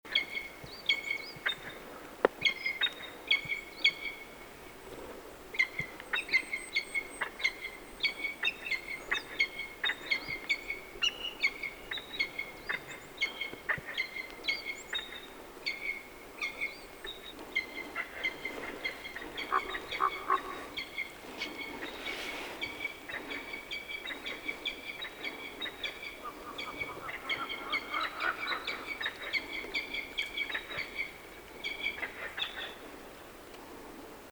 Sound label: Peregrine Falcon Falco peregrinus